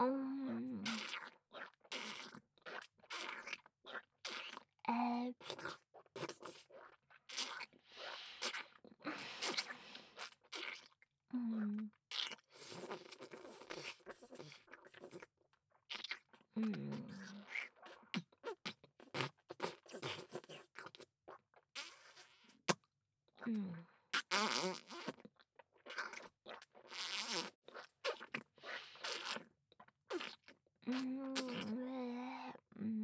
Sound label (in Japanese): フェラ